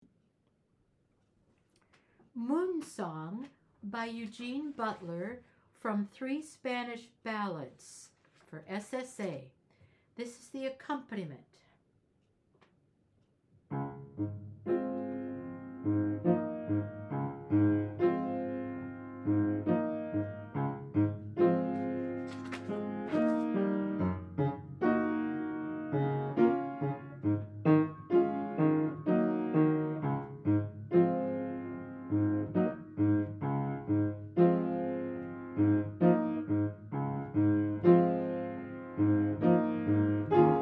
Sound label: MOON SONG FROM THREE SPANISH BALLADES SSA ACCOMPANIMENT; BUTLER